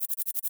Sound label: Title Transition Chopper; Adobe; Production Element